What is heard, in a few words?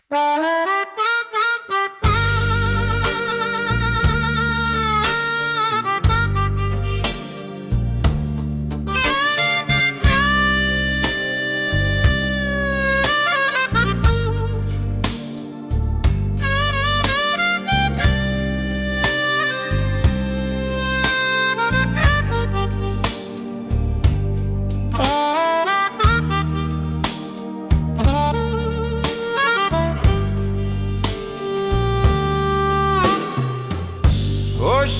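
ukrainian beat, autumn blues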